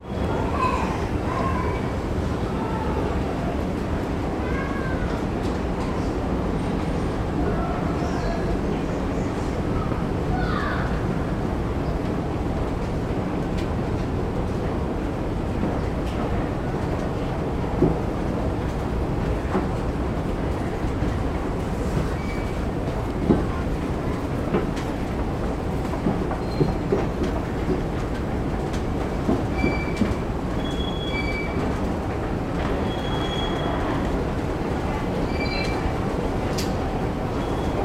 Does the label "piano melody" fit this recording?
No